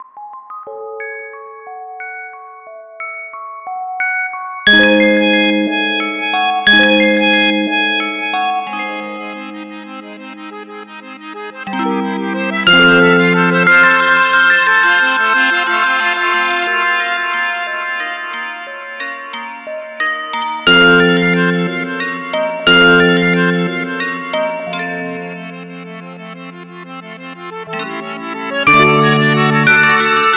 Mellow Morning Alarm; Sony Ericsson; PlusFourSix

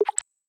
basic unlock, phone tones; LG K10 T-Mobile

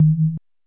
Vibe slow; Motorola M1000

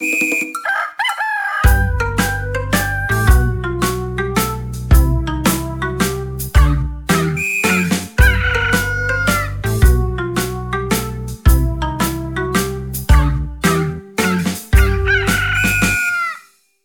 Morning song, ringtones

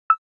Video stop, Camera; Samsung GT-E2600

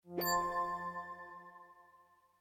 charge wireless; Xiaomi Mi-CC9

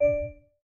Class Harp, notifications

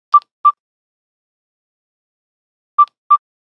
Nokia Buzzer Knock knock; Windows Phone apps

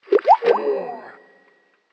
call error; Netgear SPH200W Skype WiFi Phone

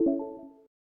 hold cube unlock; SKY IM-A870S-VEGA-Iron

